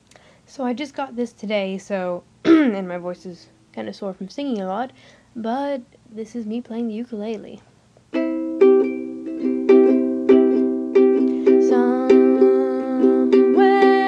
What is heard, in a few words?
Sucky ukulele